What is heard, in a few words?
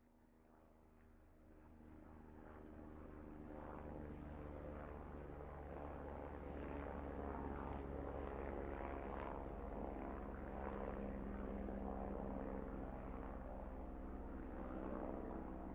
helicopter background noise